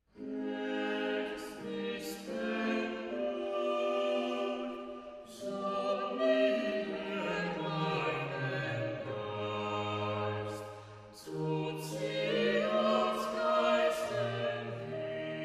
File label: chamber choir; es ist genug